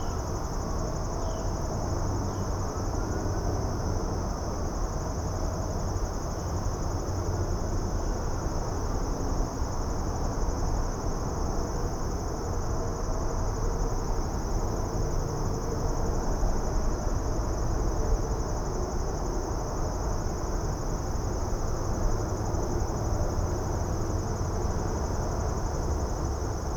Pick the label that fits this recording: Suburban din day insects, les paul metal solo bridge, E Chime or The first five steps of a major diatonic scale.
Suburban din day insects